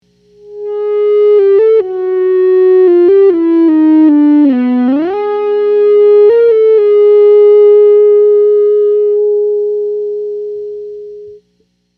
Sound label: EBow